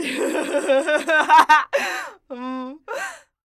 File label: laugh